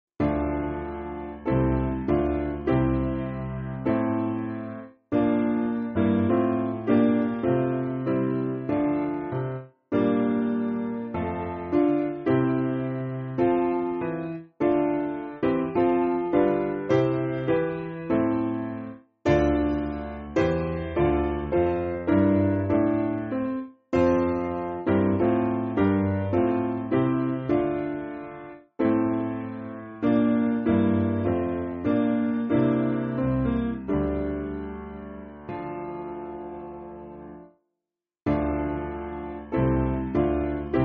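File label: Piano, Music Backing Files for Churches; Ah Holy Jesus; Rouen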